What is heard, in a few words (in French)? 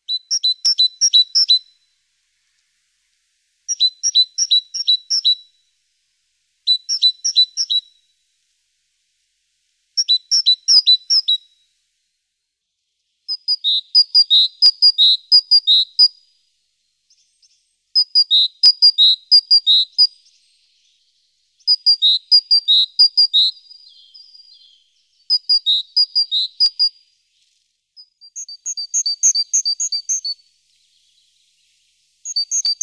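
Mesange charbonniere, oiseaux; birds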